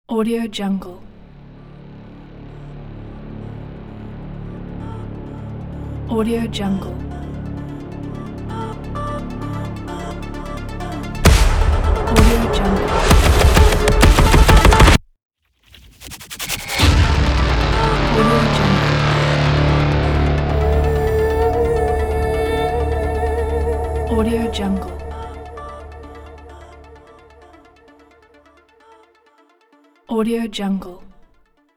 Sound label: trailer intro ident